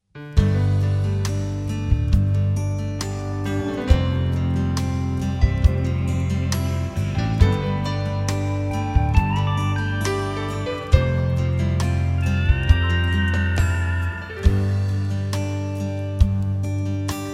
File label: Mix Original